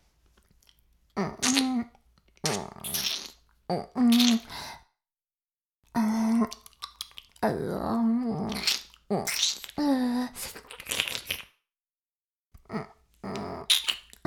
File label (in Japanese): フェラ音声; 白くて黒い魔性円環